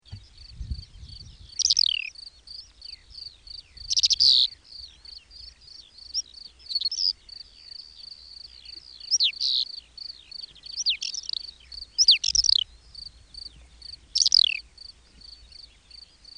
Parlagi pityer; Madarak

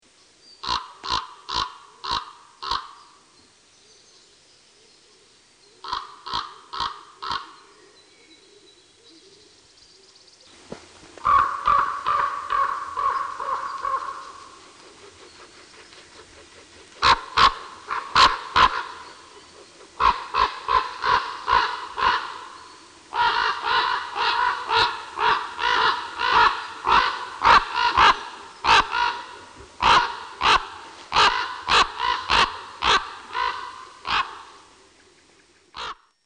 Hollу; Madarak

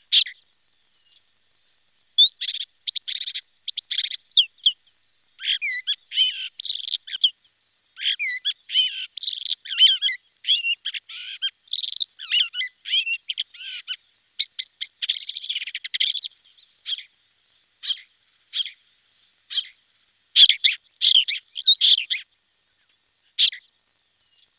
Kis хrgйbics; Madarak

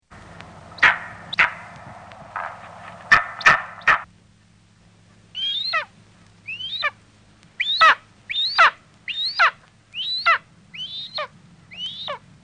Parlagi sas, Madarak

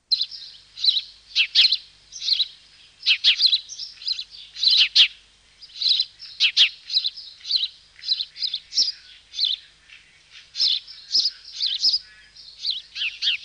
Hбzi verйb; Madarak

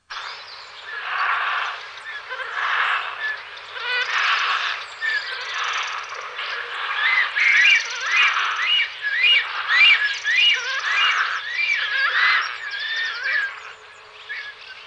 Fekete gуlya; Madarak

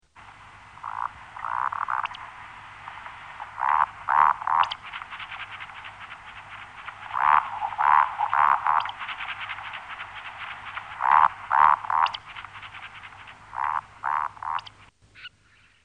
Erdei szalonka; Madarak

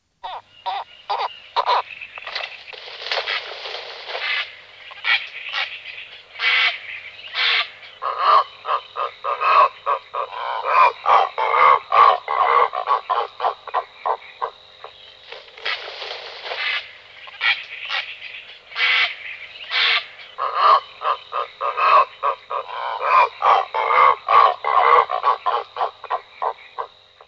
Vцrцs gйm; Madarak

